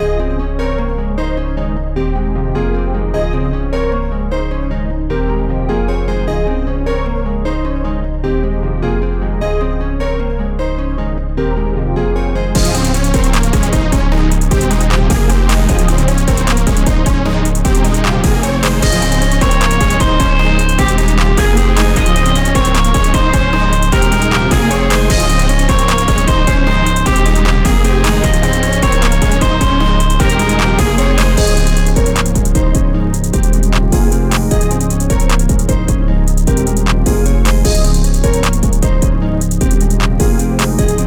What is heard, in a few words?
Rave